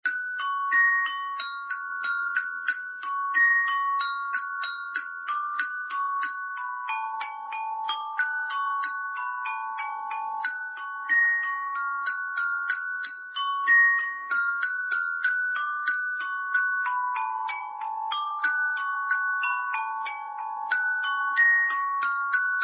glockenspiel instrumental ringtone

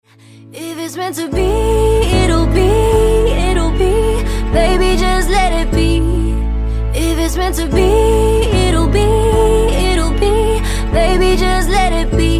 Acoustic; enghlish

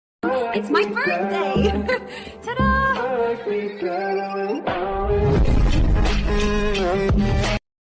birthday song ringtone

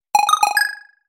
Message Pops

